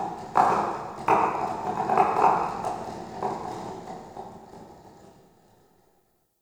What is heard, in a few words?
Ship Creak; Warframe; Railjack; Ambience